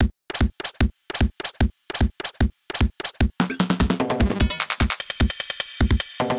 Breakdown, Drum Machines; LD Compuspank; Ableton

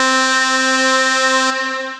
Low Lead, Synth Lead; Ableton; Factory Packs; Build and Drop

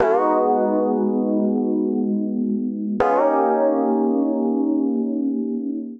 Piano G# Minor 80 bpm; MIDI Clips; Tonal; Accompaniment; Ableton; Factory Packs; Chop and Swing

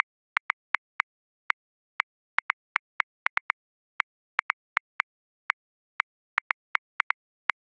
Polyrhythmic Dance, Drum Booth, Grooves; Ableton

